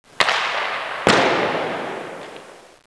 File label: puck, sports; KABOOM! - Wild and Wacky Sound Software